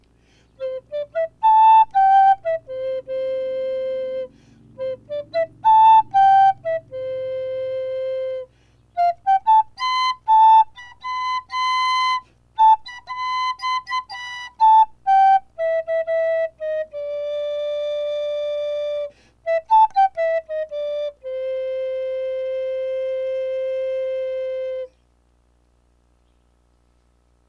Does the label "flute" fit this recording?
Yes